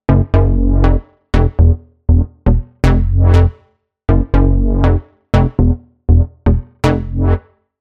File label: Wheels Wobble; Cherry Audio Mercury-4